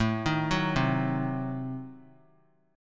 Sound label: A Diminished 7th A down; Adim7 arpeggiated; JGuitar